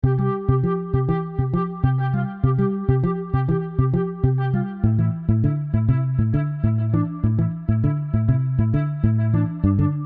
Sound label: wah groove